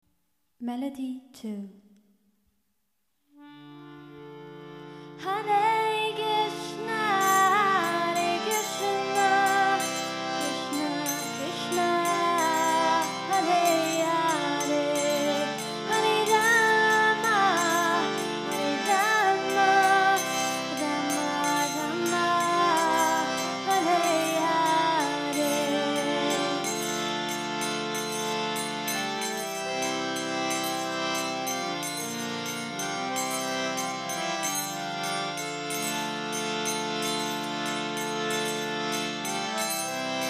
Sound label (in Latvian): hare krishna melodija, easy harmonium